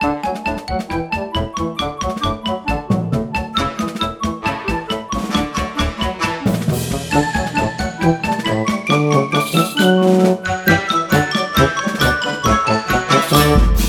Tune Loop; Fable Heroes

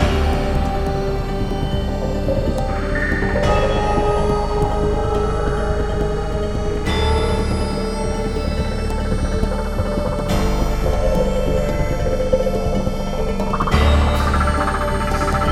Gamelan techno Slow

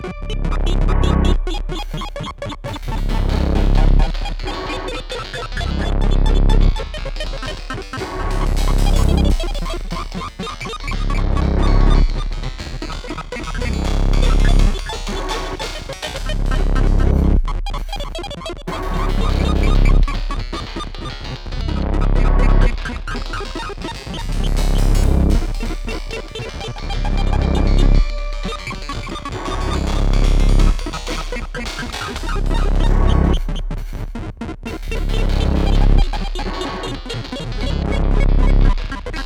Technical loop; Music Ch03; Arca's Path VR

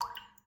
Water Droplet; game rip; lethal company version 40

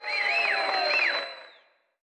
Karaoke Whistle

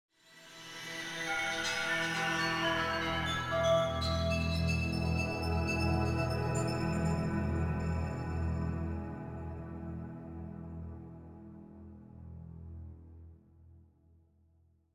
Environment; backstab android ios gamerip 2011

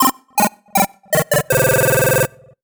MUSIC EFFECT Solo Chip Square Negative (stereo); startide macos switch windows gamerip 2017

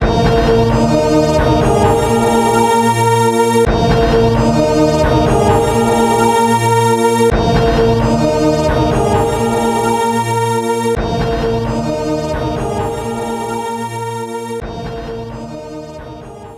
Battle Win; Vulgord's Tower

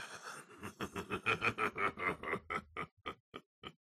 Spring laugh; gamerip; the joy of creation halloween

